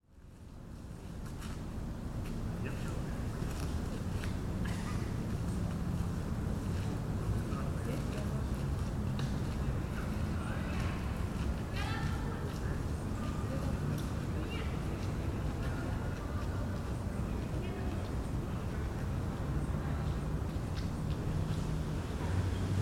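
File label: gospitalnaya street, sergiyev posad, evening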